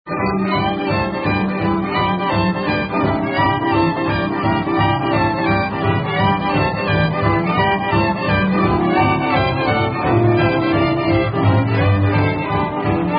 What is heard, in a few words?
Git Fiddle Boogie; 1940s; swinging hollywood hillbilly cowboys